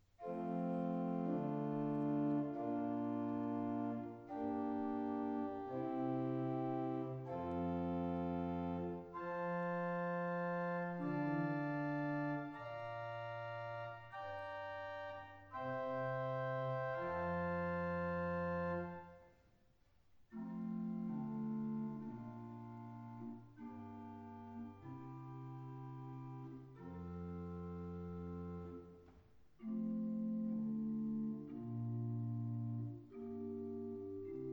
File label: Steinmann, pipeorgans